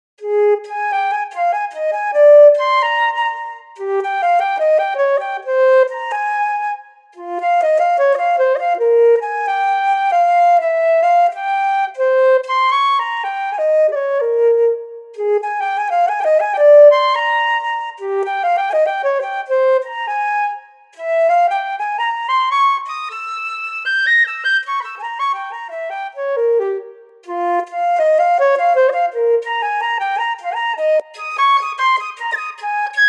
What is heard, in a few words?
FLUTE